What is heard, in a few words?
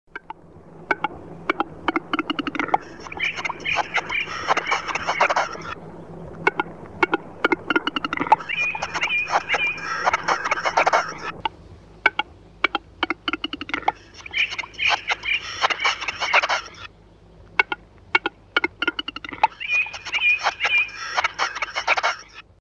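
black grouse; tetrev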